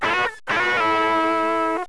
GUITAR

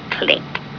click; space ghost

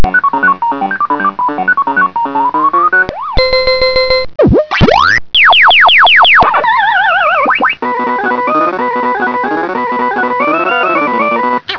PACMAN

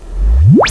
LASER UP

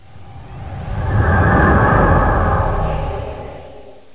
CLOAK ON

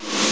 WHOOSH

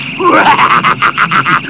laugh; space ghost

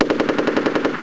AUTOMTIC

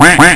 DUCK

